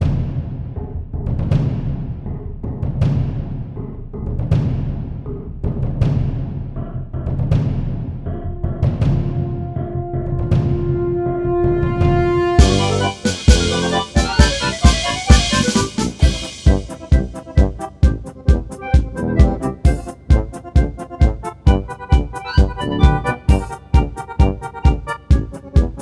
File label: polka